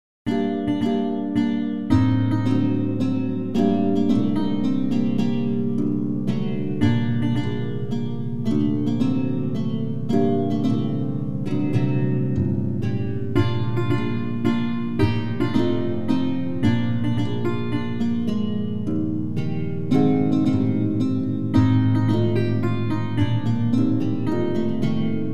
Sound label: mazurka, torban